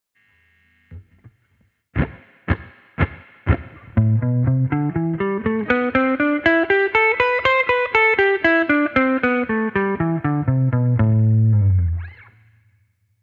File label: blues track